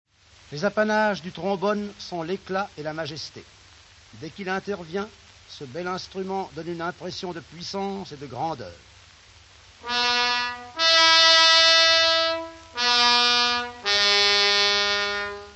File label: trombone, orch